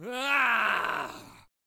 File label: Death Vocals; Brawler